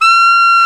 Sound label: sax alto mp F, Short